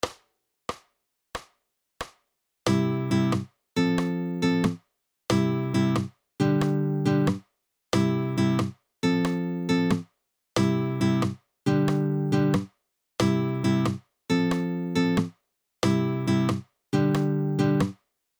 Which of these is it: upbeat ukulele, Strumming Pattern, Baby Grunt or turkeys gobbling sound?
Strumming Pattern